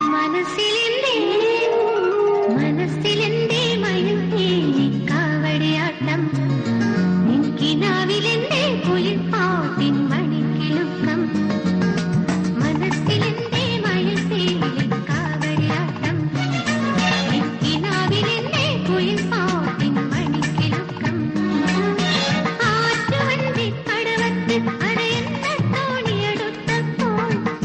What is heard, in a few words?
song